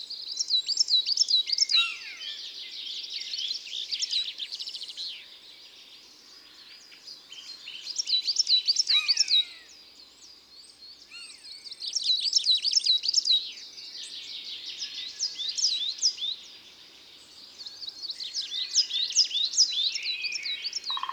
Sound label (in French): Mesange noire; Isere; Concerts naturels de l'Isere; Studio Les Trois Becs